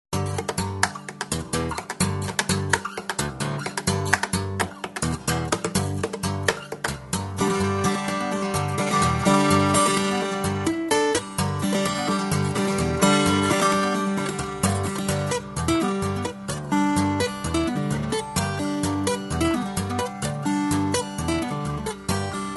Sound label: Acoustic, guitar; JustGuitar; Cantor